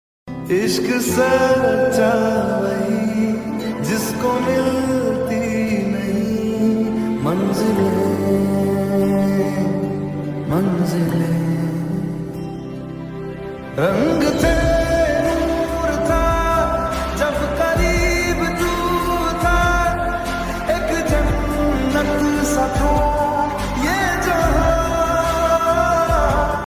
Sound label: hindi sad song lofi reverb